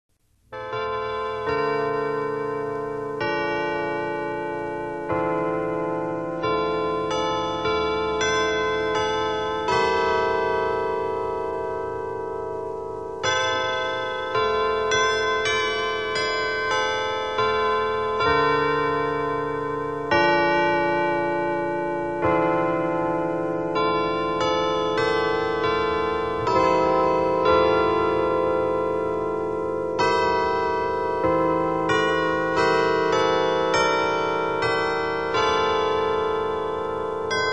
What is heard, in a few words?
carillon chimes